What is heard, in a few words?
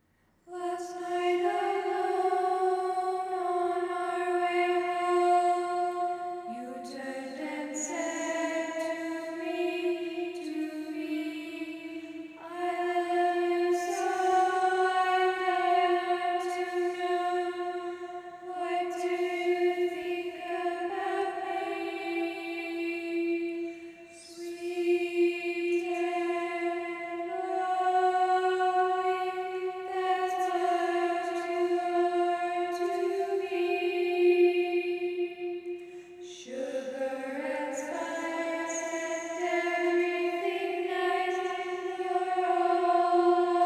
creepy